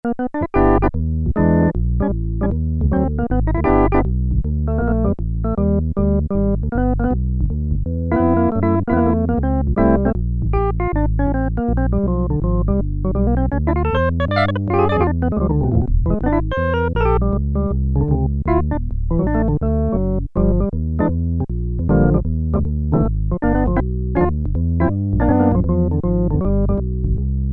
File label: Jazz Organ; File 2 Programs